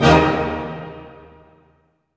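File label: orchestra hit major; String Master Series; Dynamic Orch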